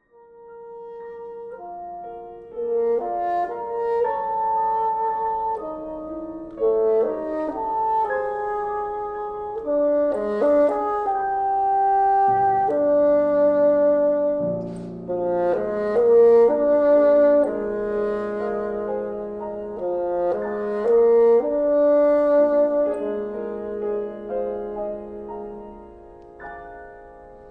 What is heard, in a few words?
bassoon